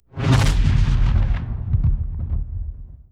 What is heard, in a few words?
Explosion Deep Flare; Weapons & Explosions; Sony Pictures Sound Effects Series Volumes 1-10; Sony Creative Software